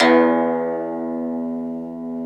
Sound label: Su Gzheng E1; Multisamples; Digital Sound Factory Planet Earth